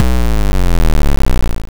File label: Single Electric Basses, Dance Floor Bass; Best Service